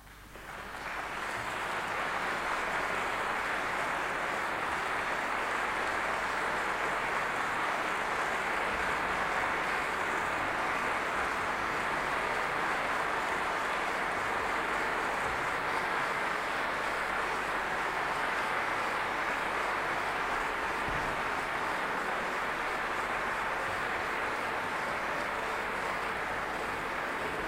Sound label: Applause